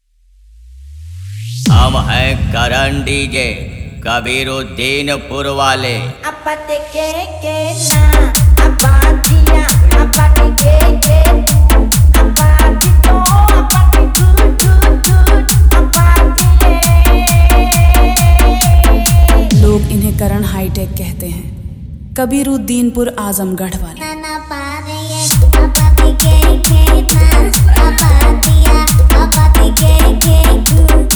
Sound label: Viral Trending Beat Hard Viberate Mix